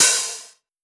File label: Open Hat Bands, 909; Slate; Vintage Drum Machines Volume 3 909 Edition